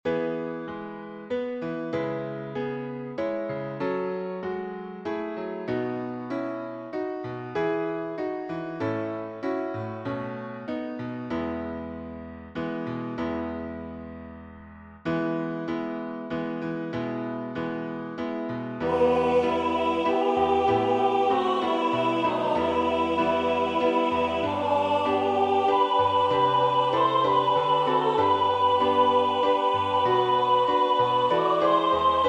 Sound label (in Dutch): Sopraan; Schriftlied